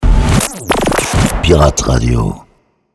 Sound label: sweeper medium